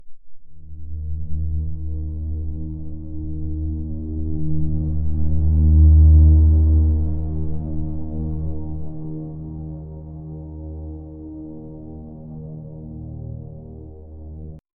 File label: eerie ambiance with low